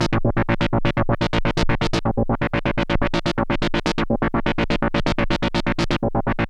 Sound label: LFO 125, SYNTH SEQ